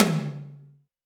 Alesis D4 Tom Hi Cannon

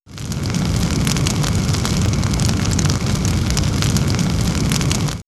Nature, Fire; X-Static Goldmine

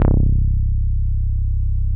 MOOG1 BASS C1

